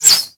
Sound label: Arrow Whizzby; Warframe; Bullet Impacts; Whizzbys